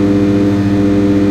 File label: fluorescent lights loop; ambient, spacial loops; Left 4 Dead 2